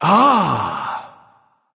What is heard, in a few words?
"ah", discover; smite; voicepack; ullr